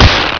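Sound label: alien grunt attack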